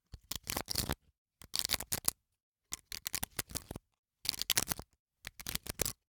Hwatu, card game, hard plastic, small, clatter, stack, pickup, drop, loose, various, short; Eiravaein Works; Vaeyan IV